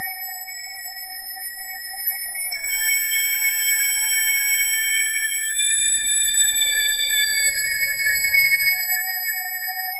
magical glimmering

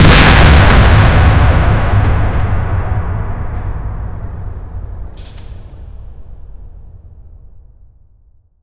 apocalypse

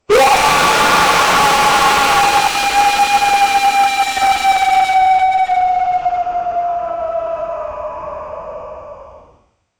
an alerted sound of some, infected

